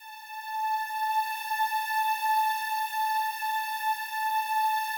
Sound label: slow strings A4, Yamaha VP-1